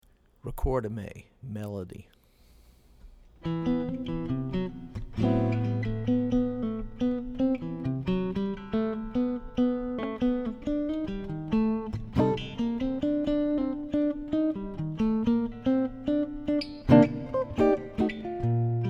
melody; jazz tunes

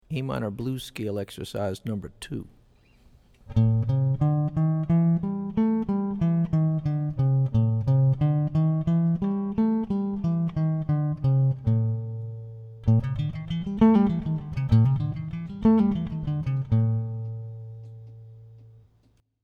A Minor Blues Scale; lead skills materials

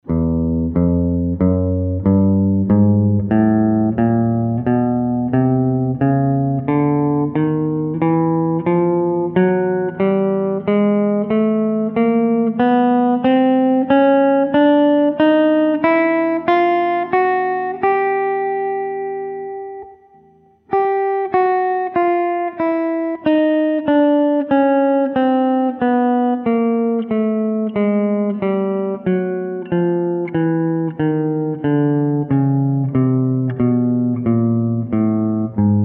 Chromatic Scale Up Down, guitar; introductory guitar skills worksheets

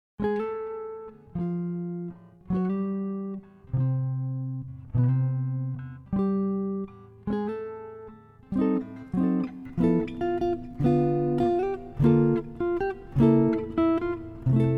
Chord Melody; jazz tunes

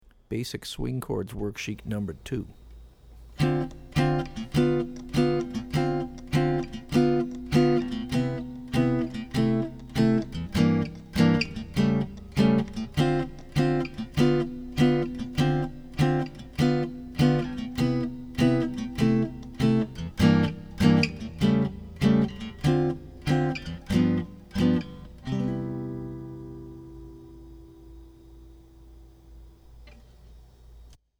Basic Swing Chords; jazz chording

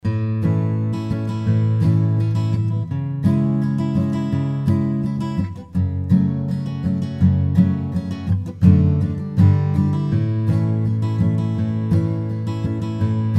Key of A, strum style tunes

